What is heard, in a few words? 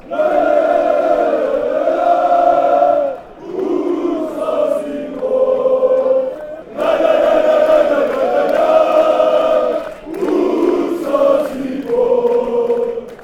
lay lay lay, chants; bursaspor